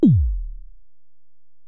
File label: EPerc, Alesis DM-PRO